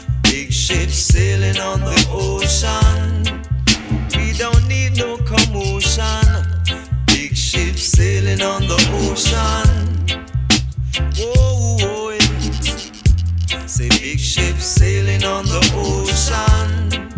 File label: reggae